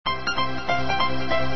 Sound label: melody